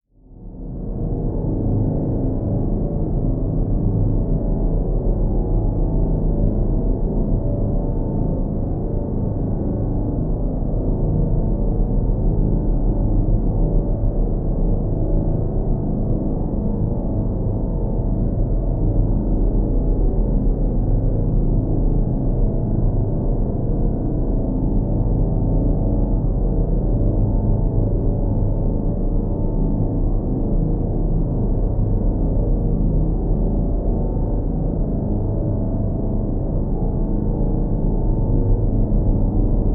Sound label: Drone Deep Bass Square Wave Flange; Production Element Imaging; AdobeCC